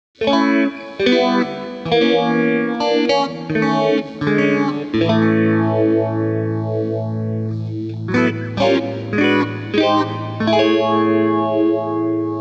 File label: Guitar Phaser, AXE FX 3